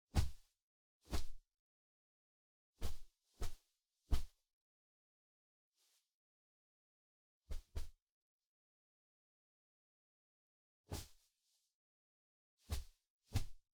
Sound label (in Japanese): 布団をぽんぽん叩く; 3DIO; 布団の音